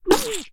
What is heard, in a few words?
attacked, Mud Octopus; Fate The Cursed King; MONSTERS